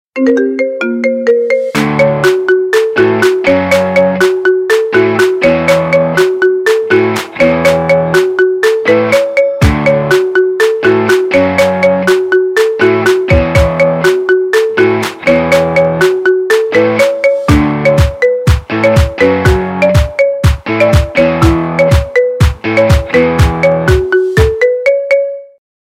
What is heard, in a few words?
Iphone Remix Ringtone